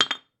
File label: Brick Drop Concrete